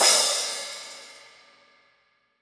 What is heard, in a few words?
Crash